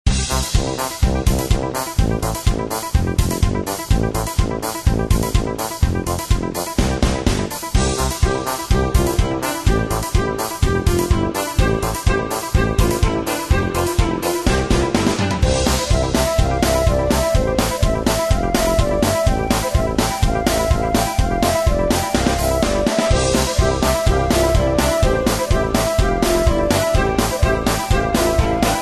Music Icemount; Candy Thieves Tale of Gnomes

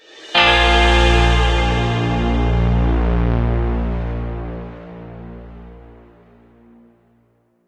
Mission Success; Fortnite Save the World

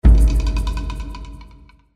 action drums stinger; crysis warhead

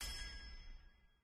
Attack Hammer; endless world idle rpg gamerip 2019

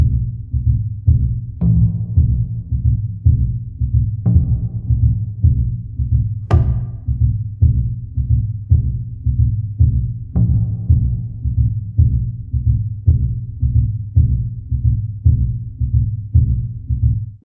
Jungle Level

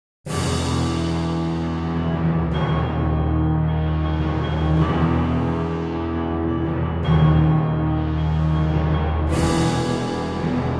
Exterior Tension; Mass Effect 2; Reaper

